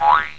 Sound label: boing